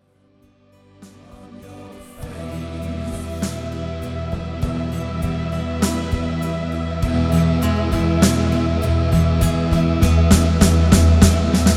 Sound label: guitar; Comeback